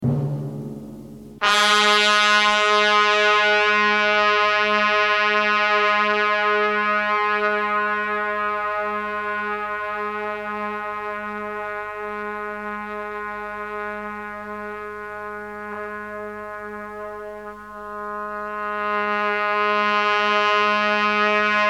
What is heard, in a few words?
Trumpet & bass